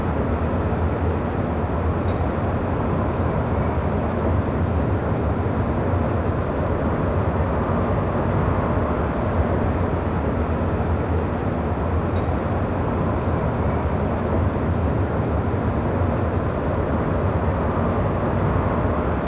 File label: city; svencoop; indokiinasounds; argyll